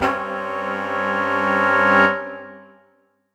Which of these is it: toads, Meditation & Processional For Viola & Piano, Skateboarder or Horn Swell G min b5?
Horn Swell G min b5